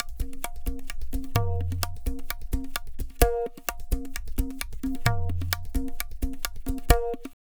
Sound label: tabla, 130 bpm; Zero-G; Pure Tabla